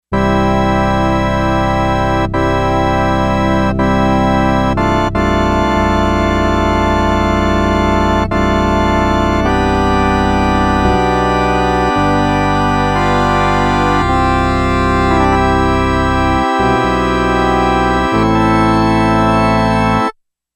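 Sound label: Pipe Organ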